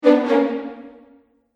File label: Fail Strings; Musical; Musical Sound Effects